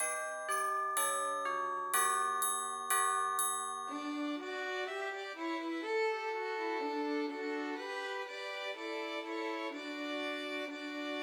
Ding Dong Merrily on High Christmas Trios for Three Violins